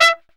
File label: Brass Stab; CMI